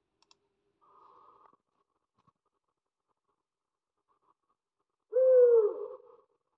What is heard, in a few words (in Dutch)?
grote oorui; animal sounds